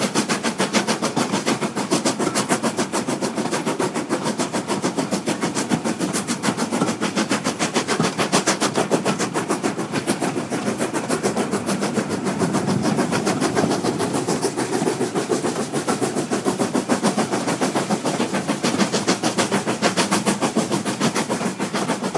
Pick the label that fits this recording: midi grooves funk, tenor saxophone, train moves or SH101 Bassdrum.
train moves